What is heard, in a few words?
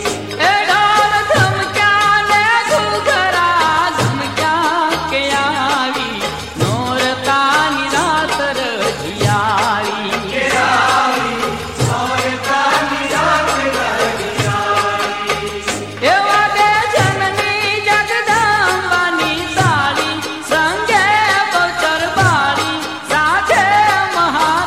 Gujarati Ringtone